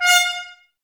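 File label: Brass trumpets F, Mute; Miroslav Vitous - Woodwind Brass Ensemble; Tps Mute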